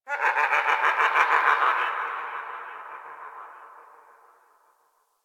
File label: Distant Zone One Creature; Warframe; Ambience; Eidolon Plains; One Offs